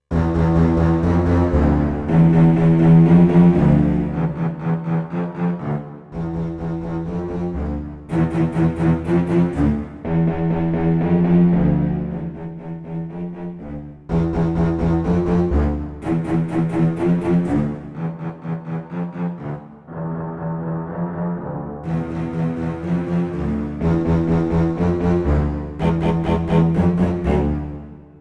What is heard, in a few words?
pulse strings